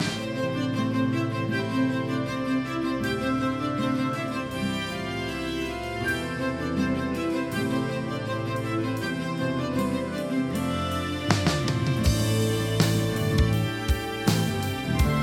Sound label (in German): Level 4 Musik